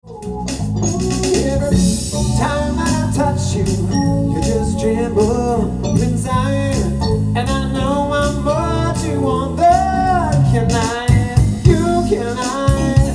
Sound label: Jazz